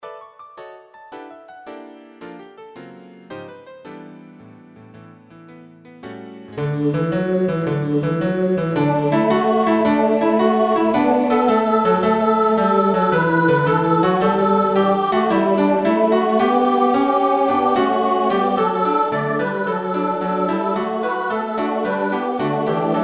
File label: tutti